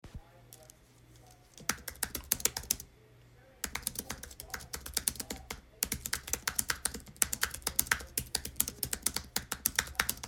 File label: Apple Keyboard